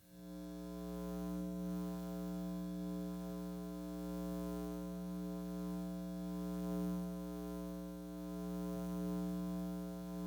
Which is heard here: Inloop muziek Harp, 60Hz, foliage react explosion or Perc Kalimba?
60Hz